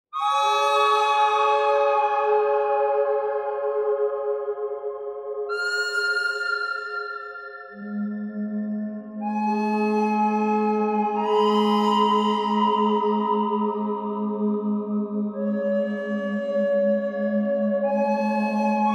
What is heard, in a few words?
bird thread